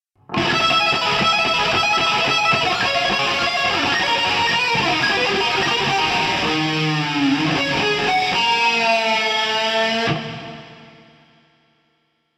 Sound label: lick; brutal legend